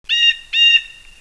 Bird; 特酷声音素材库

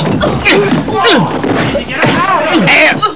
Fight; 特酷声音素材库

